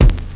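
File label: bass drum break; 300 Drum Machines; Yamaha Motif ES-Series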